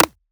Perc; Sample Banks; Percussion

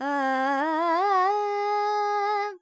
Charukesi, Vocals, Carnatic Female, Alaps, G, Dry; Sample Banks

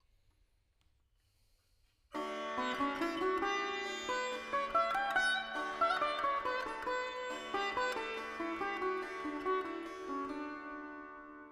Veena D 70 Verb; Sample Banks; Melody; 4-4

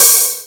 HiHat, Percussion; Sample Banks

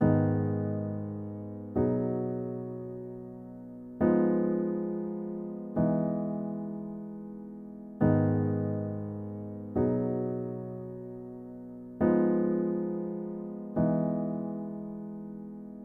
Piano Gm 120; Melody; Keyboards; Sample Banks

